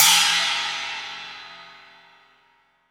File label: 20 China, crash cymbal